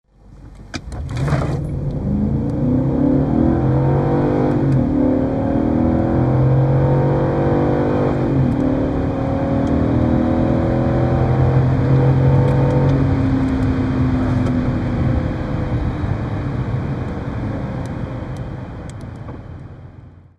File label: X5 4,4 - acceleration, bmw